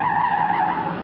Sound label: skid; player